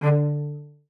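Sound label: CELLO spiccato D3; Xsample VOL-5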